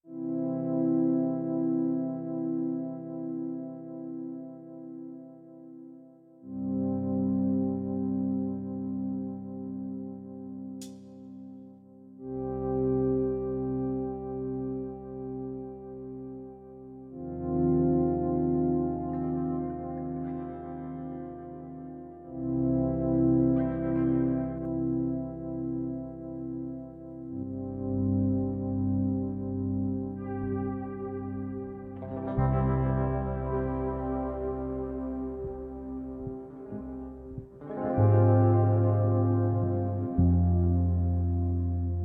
54bpm

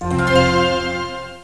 Heal Act; Knight Online

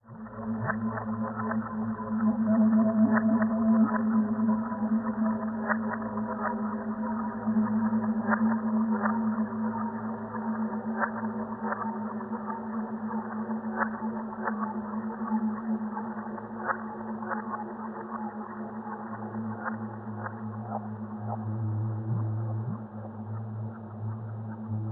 Ambience alien

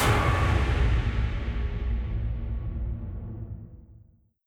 Trap Tablet Hit

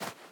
Footstep Sand

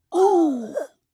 Zombie Death

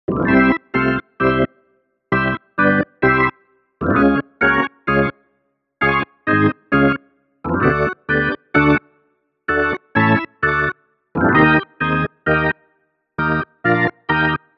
Music Baseball

